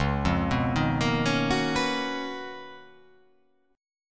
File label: Db 13th Db down, Db13 chord; arpeggiated; JGuitar